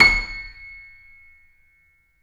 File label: C7 F, The Ultimate Piano Collection; East West